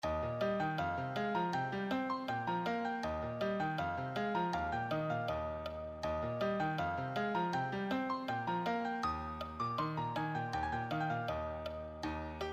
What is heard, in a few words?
advanced solo, piano